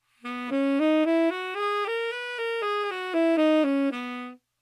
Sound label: B major scale, saxophone